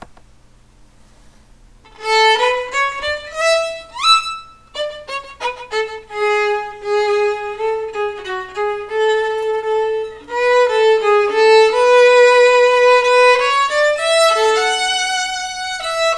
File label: Violin